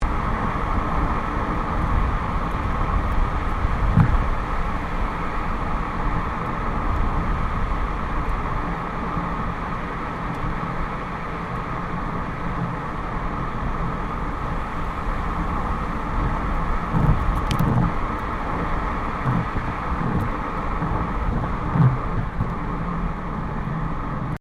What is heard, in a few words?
Car tyre noise medium catseyes; Cars (Sound Effects:Vehicles)